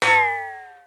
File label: Kusudama Failure; Arcade; Taiko no Tatsujin 2020 Version; Common Sound Effects